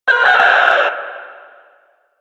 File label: Death, Enemy, Barks, Battle; Warframe; Lasrian; Lasrian Enemy C